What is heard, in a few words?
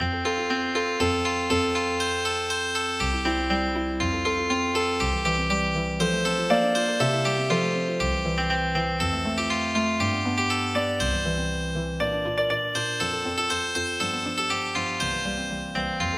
puff puff train Healing Harp